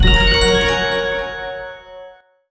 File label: before Respin; netent